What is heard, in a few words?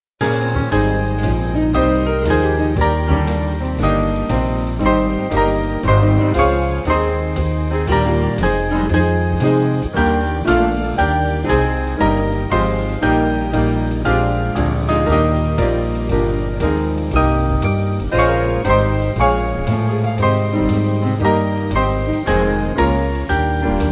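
Band, Music Backing Files for Churches; Adeste Fideles; Swing Band (CM); 3/G-Ab Upbeat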